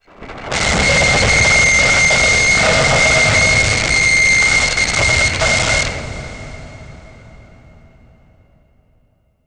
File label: Title Transition Grinding Element; Production Element